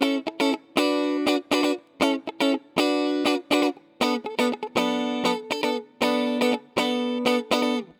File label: Guitar, 120bpm D, Nu Disco; Zero-G; Happy People